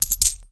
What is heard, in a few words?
delete